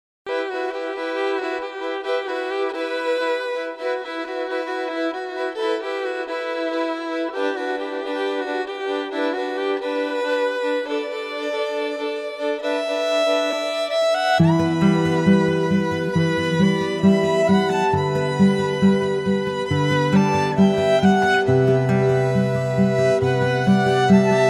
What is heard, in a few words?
Chilled Violin and Guitar